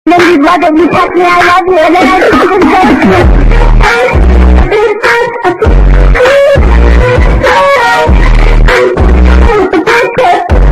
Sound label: indian bassboost